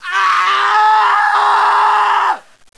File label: scream